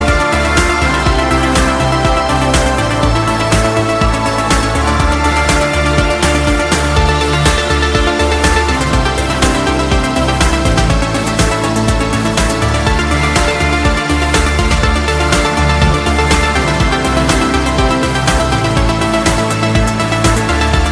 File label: acer of hz ringtone